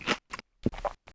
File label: eat soft